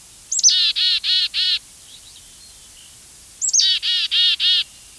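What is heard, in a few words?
Black-capped Chickadee Call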